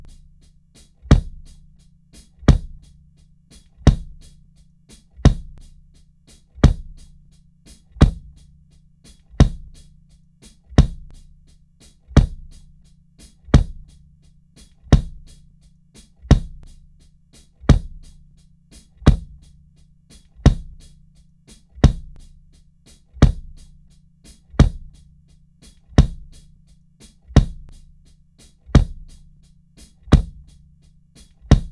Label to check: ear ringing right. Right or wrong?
Wrong